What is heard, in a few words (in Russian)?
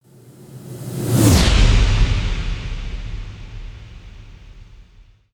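пролет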